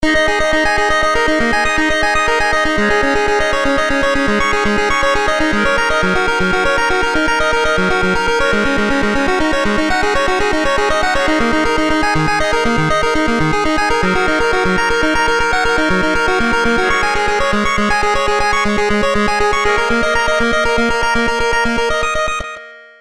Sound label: Synthesizer Noise